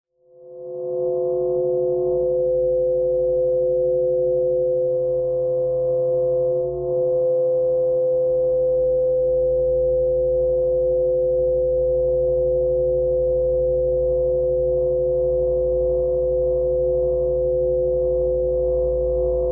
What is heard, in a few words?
ring